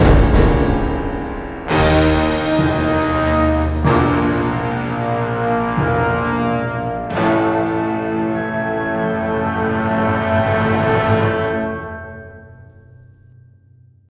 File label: death